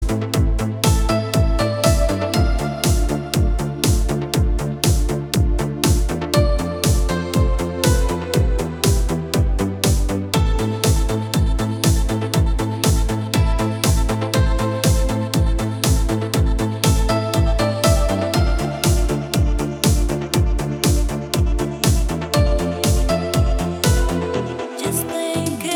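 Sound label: beautiful music for phone; Красивая музыка на телефон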